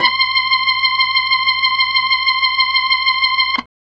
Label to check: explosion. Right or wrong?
Wrong